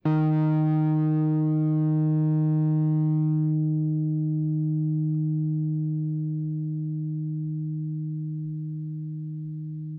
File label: Angry Guit